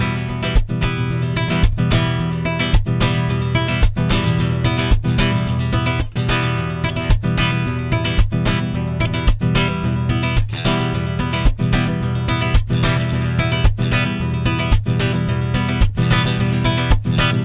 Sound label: guitar, Jazzmatazz loops